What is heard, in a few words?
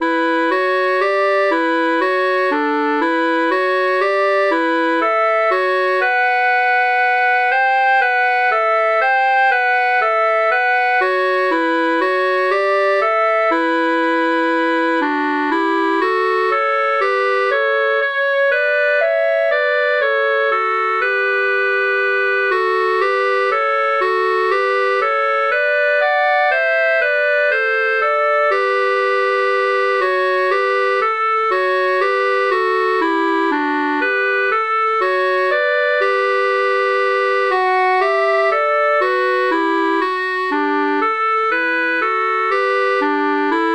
duet in 5ths